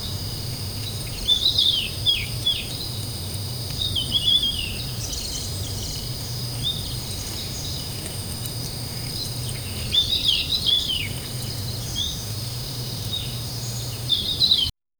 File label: soft ambient sound of ear, savannah